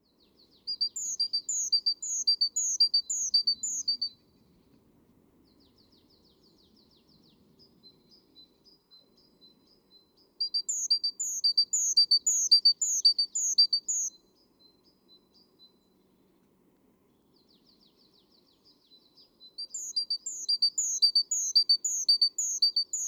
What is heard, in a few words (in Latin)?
Parus major; SETE